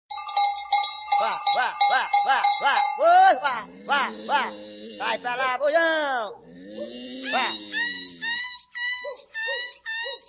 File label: Brazilian Aboio